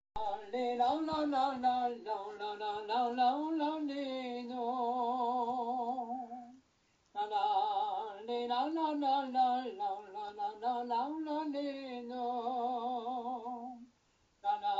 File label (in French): second air gavotte pour ar minor; gavottes ton simple